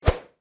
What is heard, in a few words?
sword